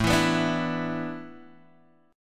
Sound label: A Suspended 2nd Suspended 4th, Guitar, Open G